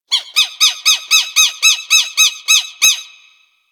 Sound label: Forest Creature Mid Medium; Warframe; Grineer Forest